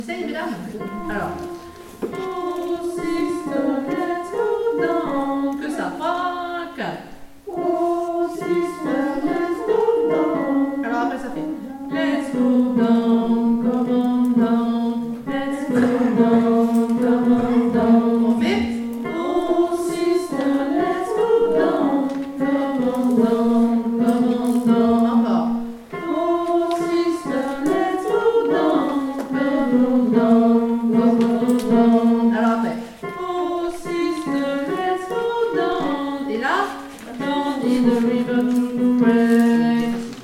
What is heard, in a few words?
Altos, chorale